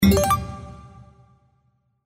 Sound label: win small; Crystal Furnace